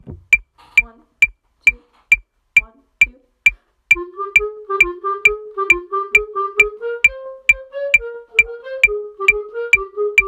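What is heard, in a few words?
trumpet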